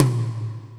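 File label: Tom Hi Wet, Alesis D4 drum machine; 300 Drum Machines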